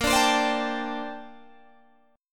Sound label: Bb Major 13th, Guitar, Open G